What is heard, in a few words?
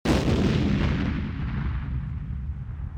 Explosion; Rogue-Like In C# Programing & Unity 2D Game Development 2022